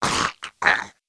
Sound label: Giant DMG